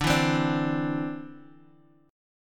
D 7th Suspended 2nd, D, down, Guitar Half Step Down